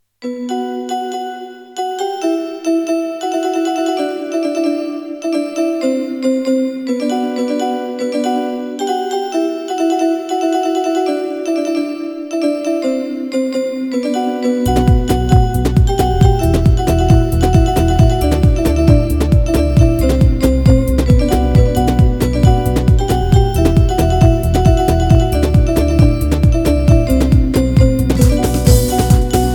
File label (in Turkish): apaci muzigi, a300tr v2